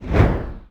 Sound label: swish large left